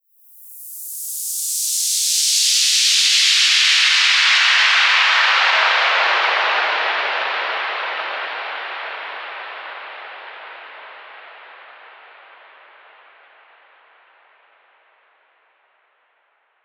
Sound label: Fx Melodic Techno